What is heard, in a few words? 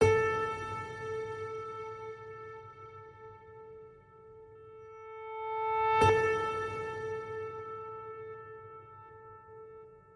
Just A Piano Note A